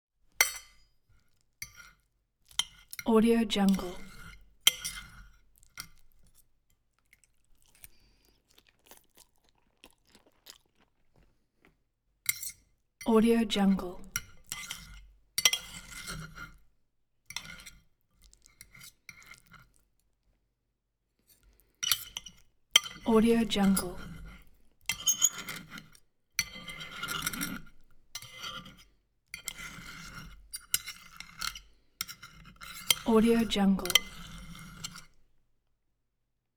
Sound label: plate knife fork eating close